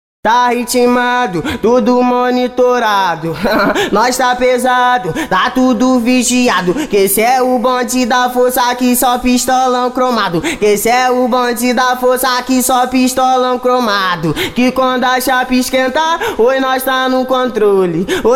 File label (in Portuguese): ACAPELLA